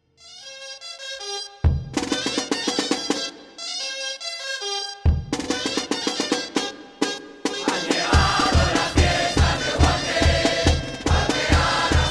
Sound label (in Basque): Gaiteroak Tonadilla; Uharte; Turrillas